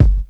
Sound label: natural hip hop kick drum single hit g key